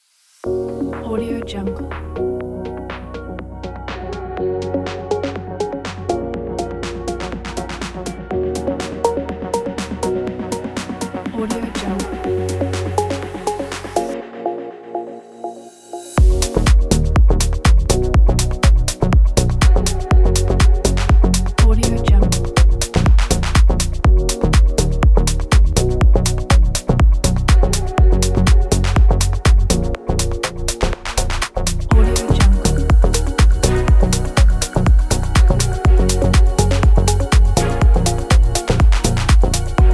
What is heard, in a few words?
in fashion deep house; magmasounds